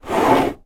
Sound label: make up box metal slide on wooden surface; household